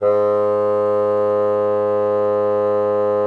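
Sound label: bassoon mezzo G#3; Xsample VOL-6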